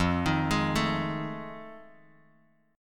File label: F 7th Sharp 9th, Bass Standard; F7#9 arpeggiated